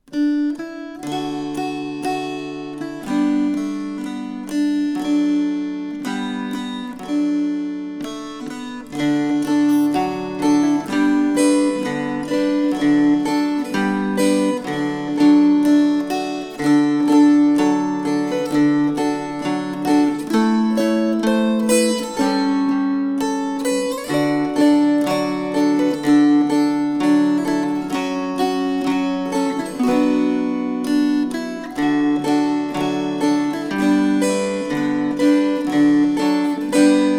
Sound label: String Choir